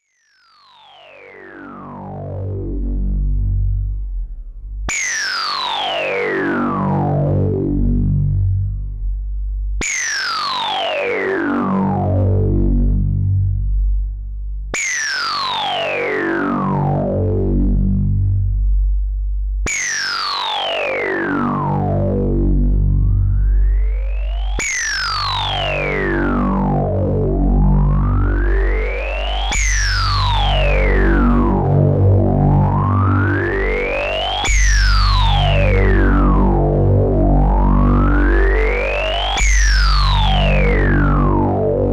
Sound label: OSCar Band Pass High Pass, synthesizer; UK; OSCar Synthesizer der einmalige Fetisch-Synth aus UK